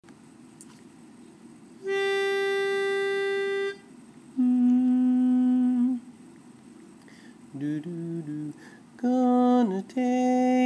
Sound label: Lead, Chorus